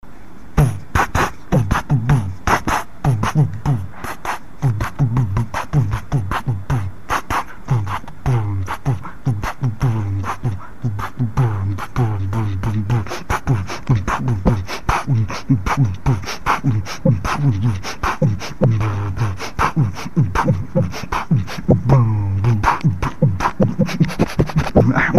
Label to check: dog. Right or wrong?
Wrong